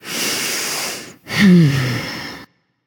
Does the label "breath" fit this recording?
Yes